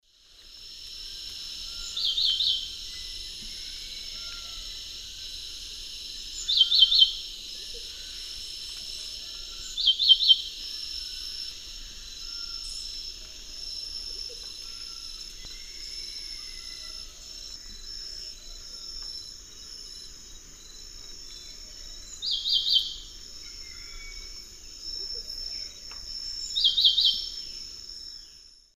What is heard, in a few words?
Henicorhina leucosticta, song